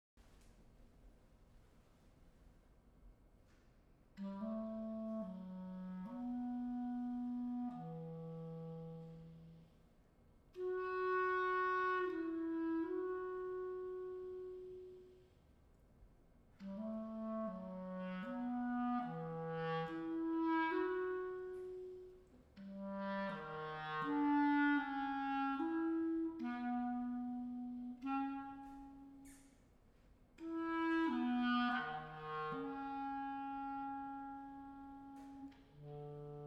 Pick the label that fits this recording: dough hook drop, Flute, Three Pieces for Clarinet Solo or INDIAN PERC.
Three Pieces for Clarinet Solo